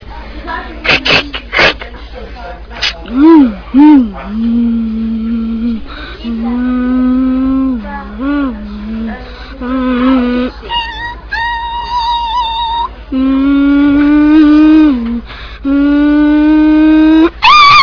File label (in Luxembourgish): droenen; Netstory